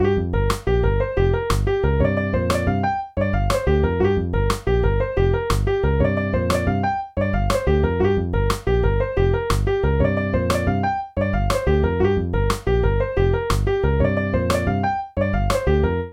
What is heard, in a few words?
with bass claps